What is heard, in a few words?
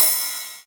909 ride; Goldbaby Tape808 & Tape909; The Tape 909; Cym